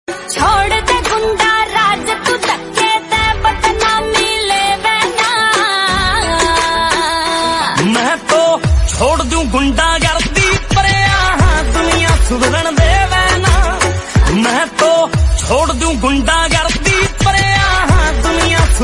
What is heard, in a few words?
Punjabi Song Ringtone